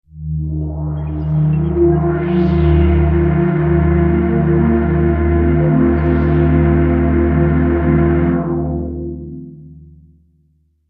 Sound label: Dream Motion